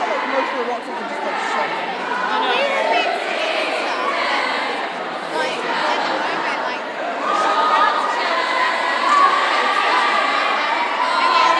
crowd pre live performance woo; StarKid

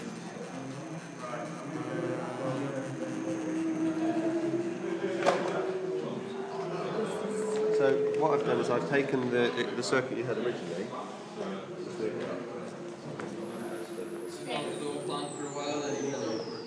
noise and tinkering; howduino liverpool

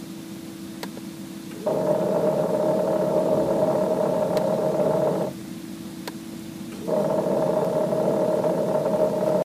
prius heater pump growling

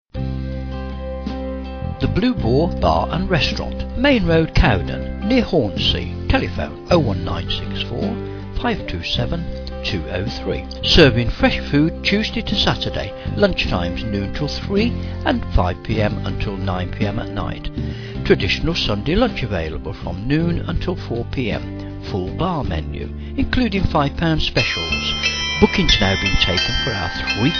restaurant bar; the blue boar cowden

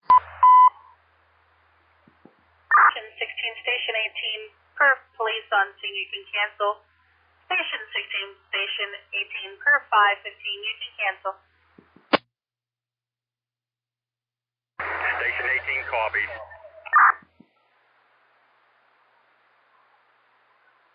alert tones page received

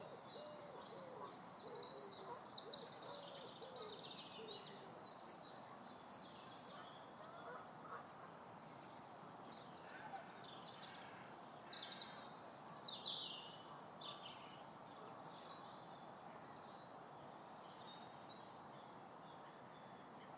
the sounds of spring

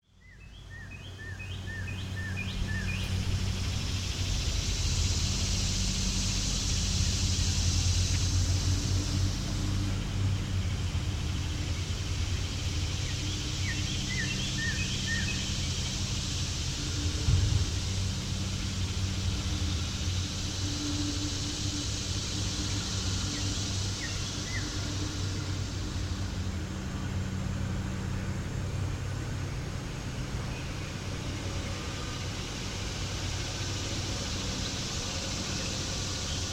ambience

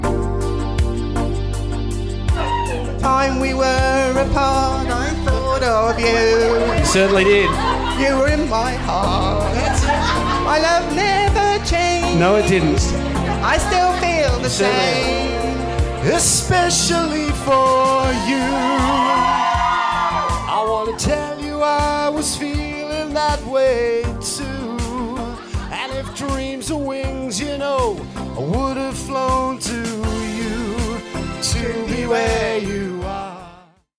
karaoke; global s make some noise

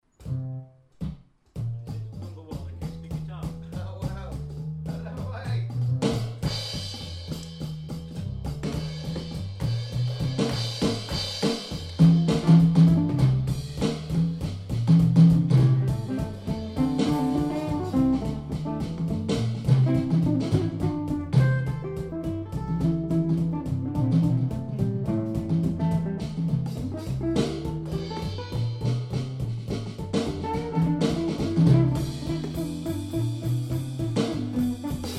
playing jazz blues; century sofas

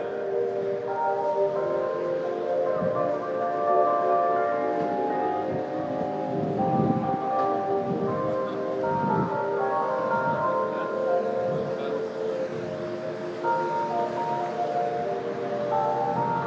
new york bells east village

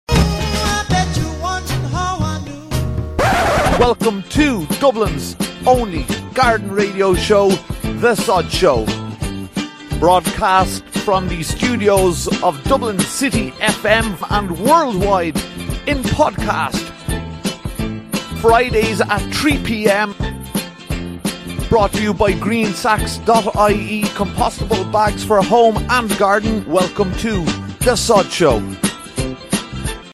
jingle; sodshow

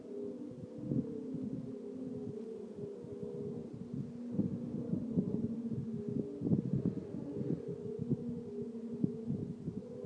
singing ringing tree

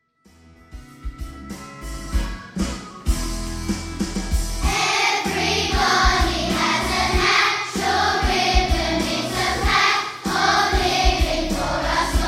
singing assembly

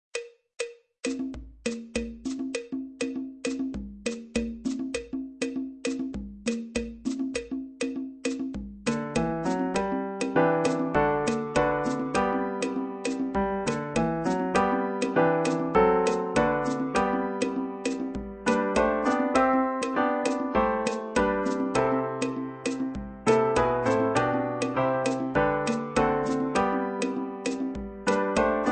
ring your bells midi

